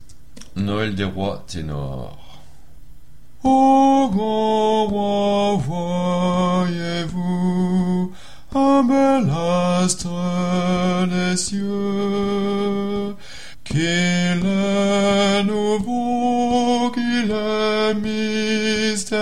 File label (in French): Noel rois tenor